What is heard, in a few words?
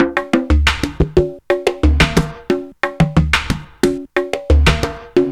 in conga rues, 90bpm